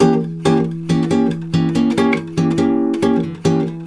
E DIM GTR, Brazil Bossa; USB Soundscan; AKAI

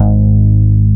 BAS MKS-50; Best Service Gigapack 2

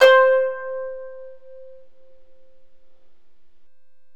KANUN C4

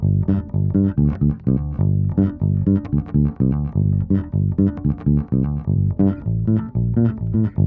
Bass, 125bpm Dm, Nu Disco; Zero-G; Construction Kits; Speedy

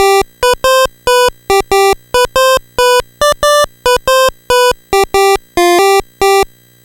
NES Synth, chiptune

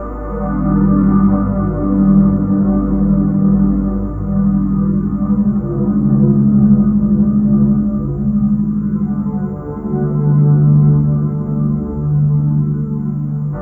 Iceplanet, Pads; Sonic Foundry (Sony Creative Software); Synchro Funk

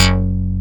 BAS Bass; Best Service Gigapack 2

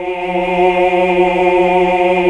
OOH. OOOH Uni

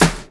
SD, dance drums hip

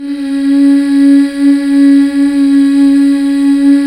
MMHH, BACK. MMMHH Fem

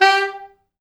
G3, Pop Brass; Best Service ProSamples vol.19; AKAI

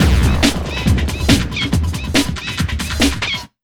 140, Big Beat Drumloops; USB Soundscan vol.25; AKAI

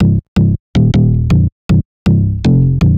Punch Bass

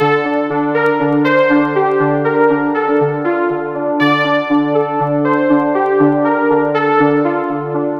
xtra ana lead; Sonic Foundry (Sony Creative Software); Ugly Remnants VOL-2

